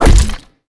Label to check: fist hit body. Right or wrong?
Right